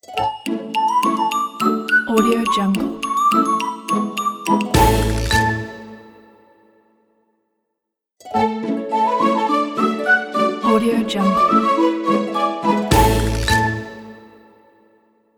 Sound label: comedy logo